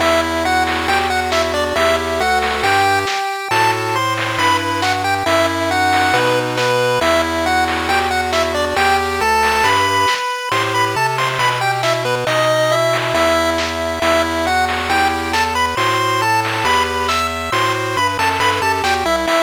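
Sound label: Ancient Vibes 8bit; Pad of Time; Switch Wii U gamerip 2022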